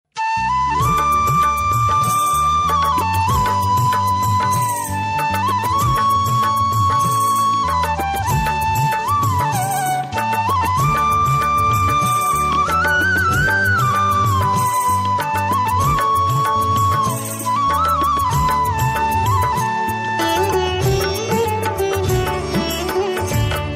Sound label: Flute Instrumental